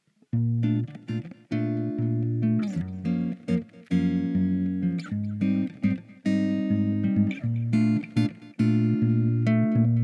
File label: Song Bird Church Life